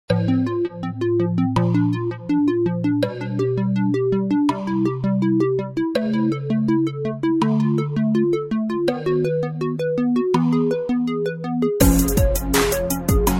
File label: In Game Music (30 Second Countdown); Kahoot